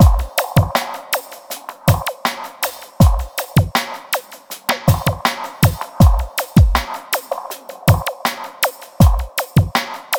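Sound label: Electronic, ambient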